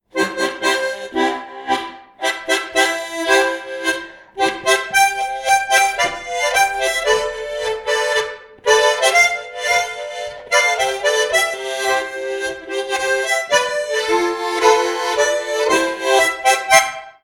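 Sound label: Bavarian Style C, harp; Seydel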